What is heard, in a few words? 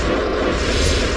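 force generic loop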